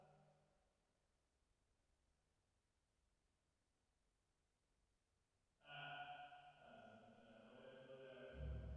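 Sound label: 480 Reverb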